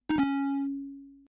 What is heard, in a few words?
zap two tone; Kenney's Sound Pack; Digital Audio